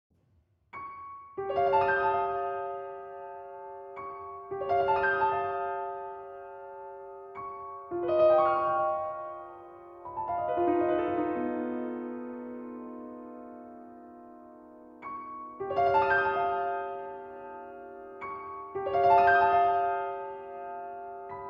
Solo Piano

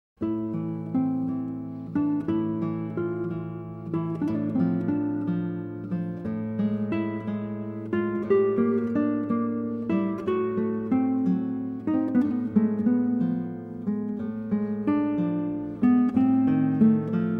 Arr. For Guitar; Duo Llobet; Iberia, T. 105, Book 1; Evocación

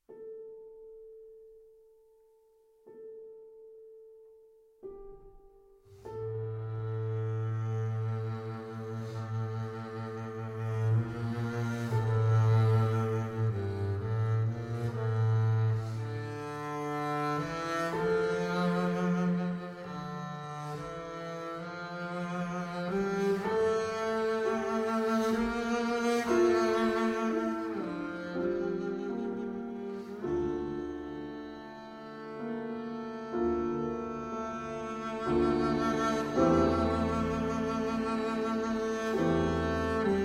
Double Bass & Piano; Andante Cantabile

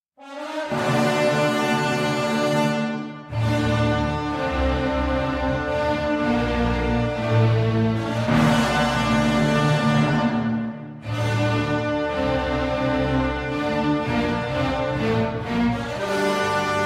Symphony in D Minor Grave - Allegro Ma Non Troppo

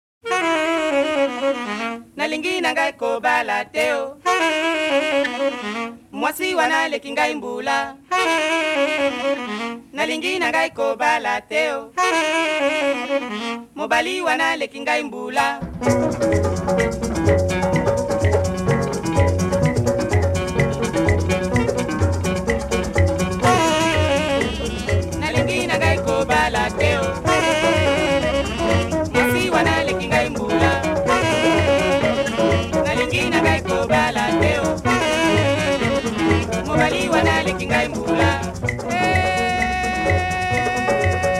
Conga Jazz

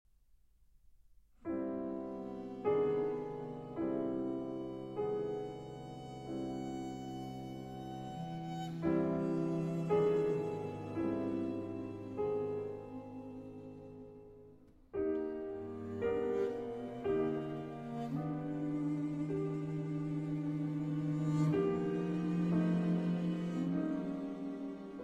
Piano Quartet, Ruhig

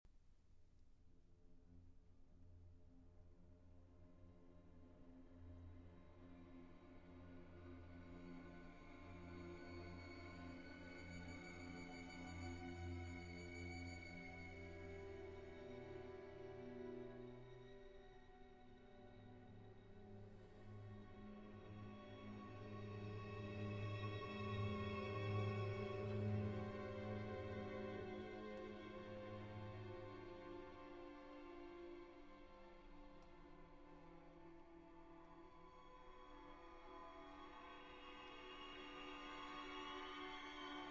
Symphony Orchestra; IV. Lento espressivo

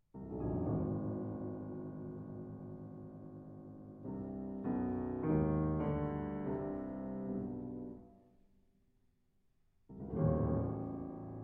Kaleidoscopes For Piano, Adagio Molto Parlando; Onovwerosuoke 5 Kaleidoscopes For Piano No. 2

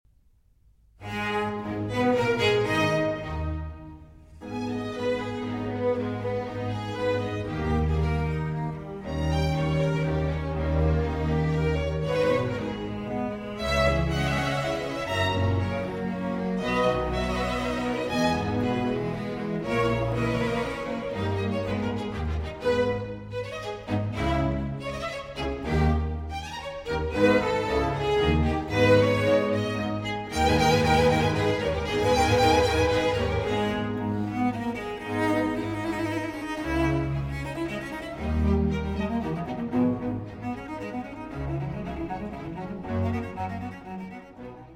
Cello Concerto in A Minor, Allegro; Vivaldi; RV 418

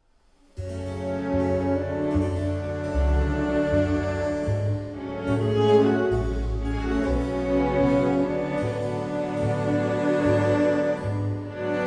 bandoneon, violin and orchestra

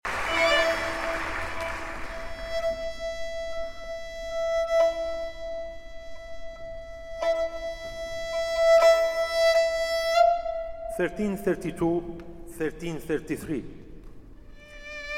Taqsim and Narration

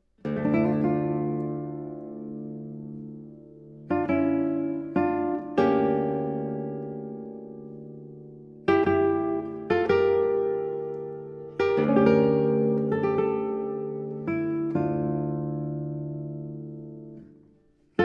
Partita in C Minor, Sinfonia, For Guitar; Partita No. 2; BWV 826; Arr. T. Manoukian

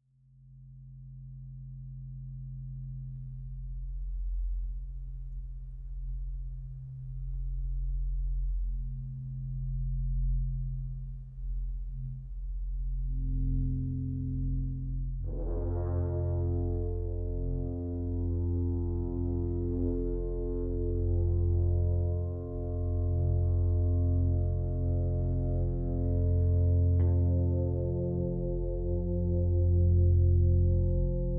Horn

